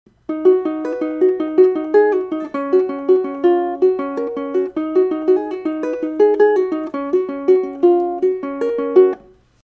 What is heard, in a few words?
Bridge, ukulele